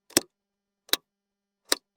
Button Switch Tap

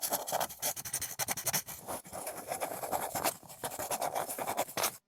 Pen Scribbling

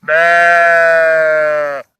Sheep Baa; Background ambience

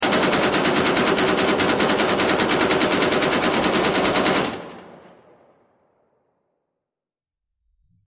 Assault Rifle Burst Fire; weapon

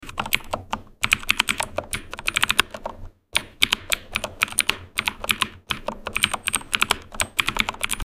Office Typing, keyboard

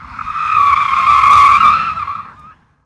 Tire Screeching

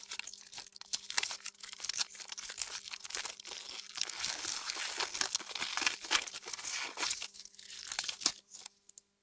Christmas Gift Wrap Sound

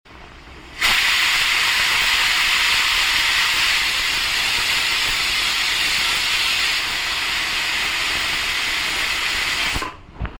Pressure Cooker Whistle

